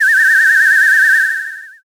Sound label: whistle long